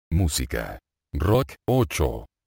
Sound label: rock